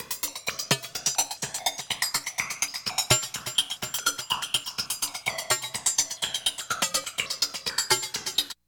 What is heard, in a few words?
SYNTH HAT 125, Trance; AMG; Global Trance Mission VOL-2; PROCESEDLPS